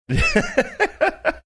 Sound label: laughing